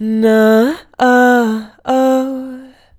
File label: "Na oh oh", Male Vocals Ad-Libs C Major 150BPM; Ghosthack - Free Male Vocals and Atmospheres